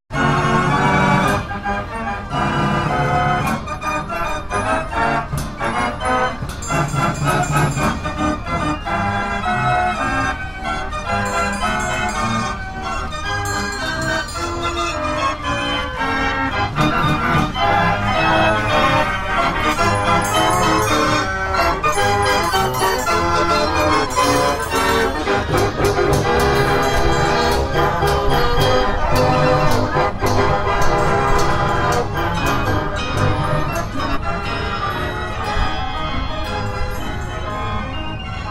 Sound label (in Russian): zvuk karnavala